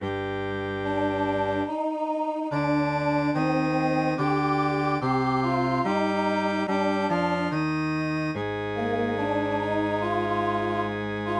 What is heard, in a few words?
MIDI